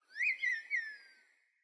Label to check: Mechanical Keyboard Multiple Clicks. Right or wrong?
Wrong